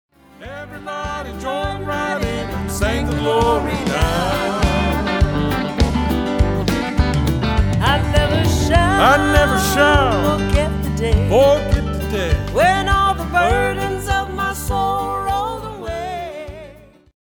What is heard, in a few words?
Southern Gospel Medley